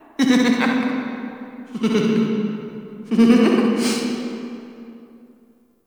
Recorded Children Misc Amb; Ambience; Dungeon Island; Duviri

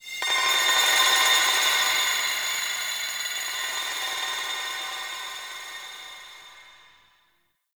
Glassy Texture, Ambience; Warframe; Shadowgrapher

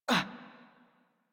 Generic Short Pain Operator Voice

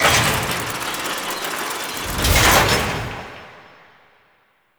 Tailor Shop Incubator Close; Warframe; Ambience; Nineteen Ninety Nine; Interior

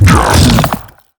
Hand Bomber Attack; Warframe; MiTW; Battle Barks

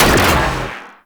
Shotgun Fire 3D; CorpusShotgun

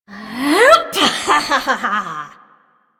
Lift Laugh, Corpus Lich; Warframe; Persona D

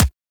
Weapon Punch Pistol; Warframe; Weapons

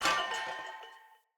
Corrupted Battle Dog Bell Tail Wag Foley Fill

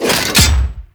Ghoul Devourer Hook Tongue; Warframe; Grineer Ghouls

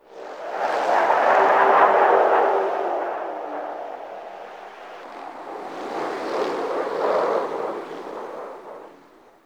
Wind Gust Med, Venus Landscape; Warframe; Venus Open World; Ambience

